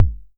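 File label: wave kick, drum; Masterbits - Total Zone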